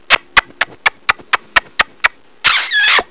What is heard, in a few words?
kiss kiss; Flirty